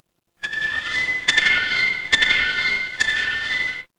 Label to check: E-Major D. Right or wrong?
Wrong